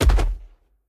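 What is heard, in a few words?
movement bodyfall; smite; tiamat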